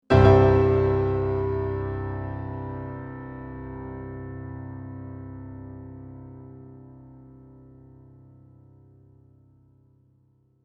Piano Crescendo, Piano Crescendo chord in c major.; Pianos; Musical